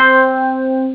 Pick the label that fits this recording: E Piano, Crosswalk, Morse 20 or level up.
E Piano